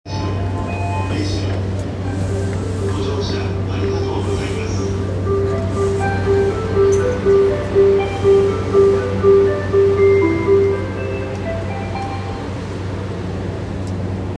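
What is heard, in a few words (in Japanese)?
山手線 目白 clockwise, train sounds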